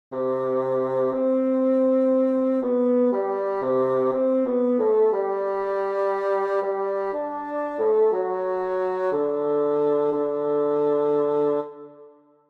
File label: bass; 2026 spring concert